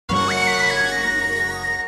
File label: sms, ringtones; Disney